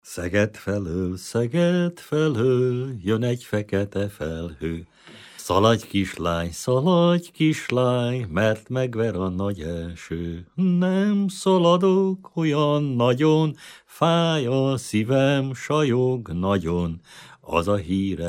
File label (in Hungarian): nepdal; szeged felol; nepdalok